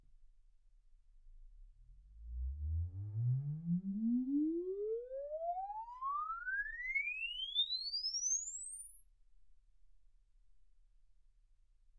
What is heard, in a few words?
exp swept sinus 10Hz 24kHz